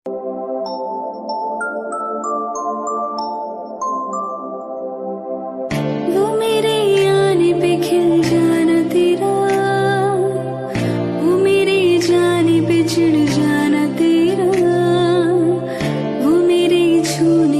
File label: female, hindi ringtone